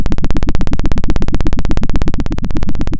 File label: pop, Sound of a helicopter